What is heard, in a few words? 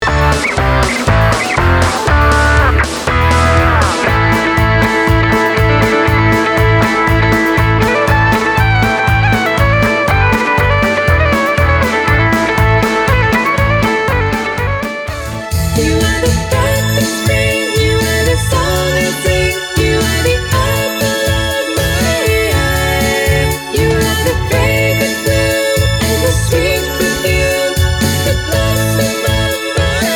Celtic Revival music compilation